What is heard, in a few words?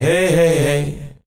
hey hey hey SUNG